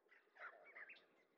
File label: monkeys; ambient soundscapes; dota